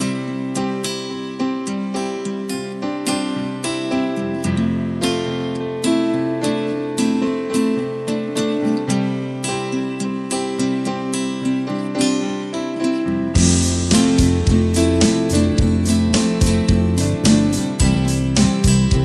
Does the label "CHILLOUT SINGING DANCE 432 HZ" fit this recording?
Yes